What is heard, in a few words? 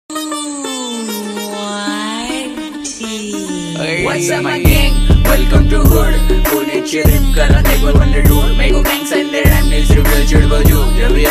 hiphop rap music